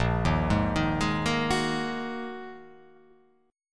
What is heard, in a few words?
A sharp 7th Suspended 4th Sharp 5th down